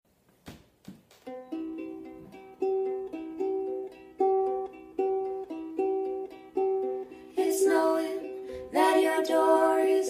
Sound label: duet